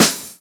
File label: SD, HIP, Dance Mega Drums; 90 s Sample CDs; Best Service